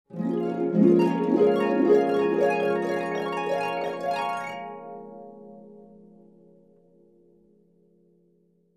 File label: Harp dreaming sound effect